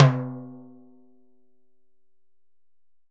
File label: Timbales Low Open Hard; Drums